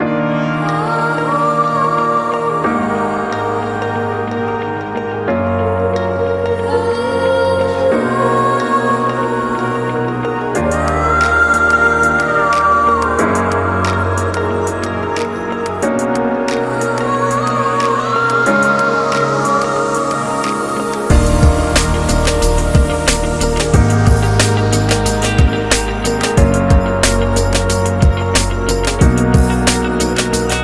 Sexy

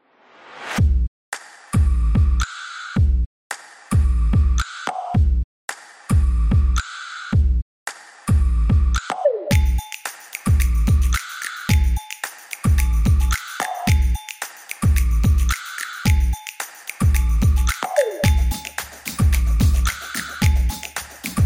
Beat

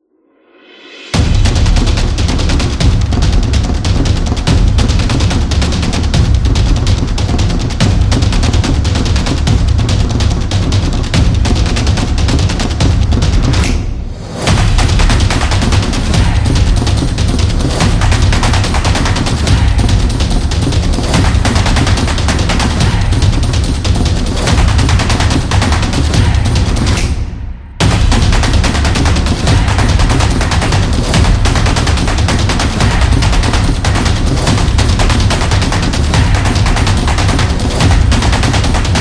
Drumming Action

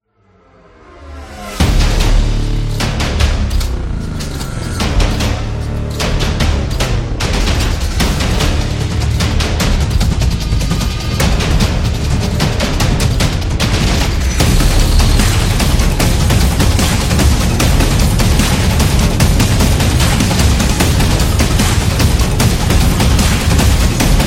Drum War; monster attack

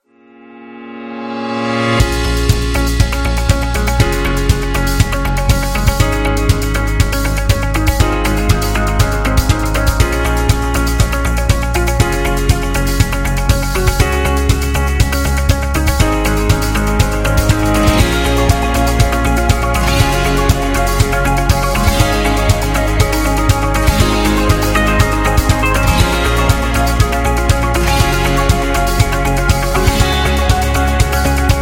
Emotional Corporate; eco-friendly

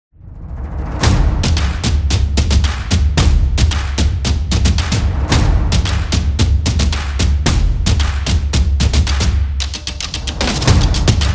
A Drum Dynamic

